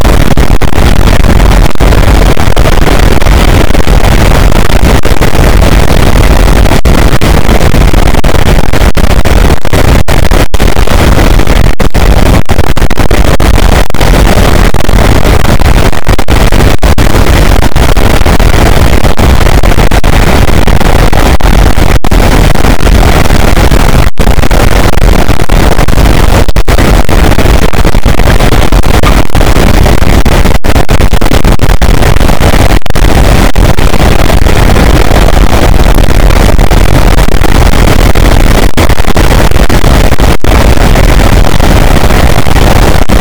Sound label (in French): cachalot negatif, boat, Physeter Macrocephalus